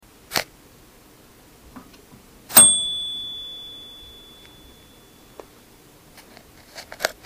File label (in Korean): 땡